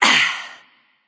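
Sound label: grunt attack, female; smite; voicepack; hera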